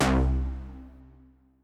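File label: Synth Plucky Bahs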